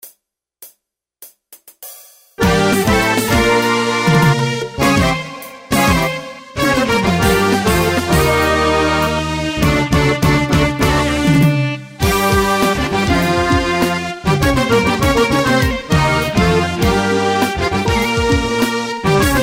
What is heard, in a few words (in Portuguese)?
Impacto musical tema de abertura